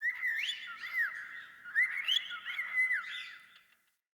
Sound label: Day Bird